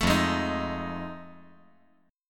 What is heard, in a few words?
F 7th Sharp 9th F; 2,5,4,6